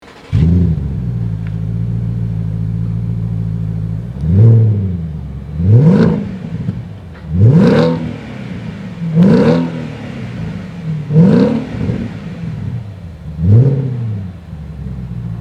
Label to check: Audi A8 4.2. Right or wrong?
Right